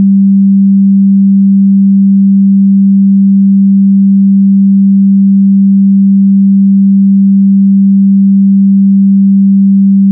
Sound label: Tone generator G3